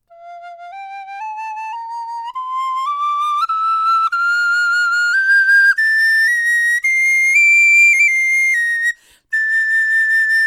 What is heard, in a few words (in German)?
blockfloeten sopranino tonleiter; schneider